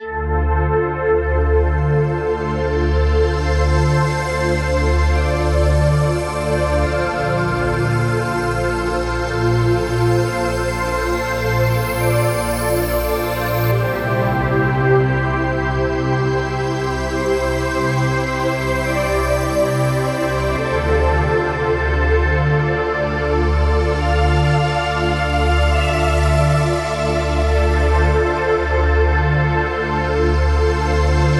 25 Kit 140BPM Pad Break, D minor; Unlooped; Vengeance Samples

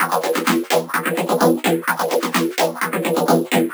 VFX2 Loop 128 BPM

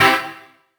Synths C; Vengeance Samples

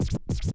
Cuts, Vinyl Record Samples; Cuts Medium

